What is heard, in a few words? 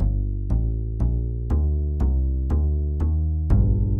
Blues Bass Acoustic Gm 120